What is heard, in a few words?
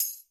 Tambourine Acc